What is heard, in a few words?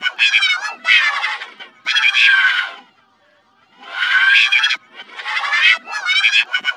VICTROLA LA, Human; Distored Reality